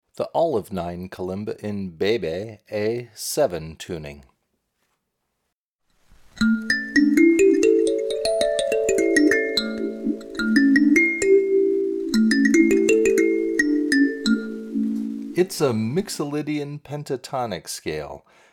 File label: Olive Tuning, kalimba, C Major Tuning; Olive-9 Kalimba; Magadi Olive-9 Kalimba; C Major Tuning – The Most Vanilla Tuning!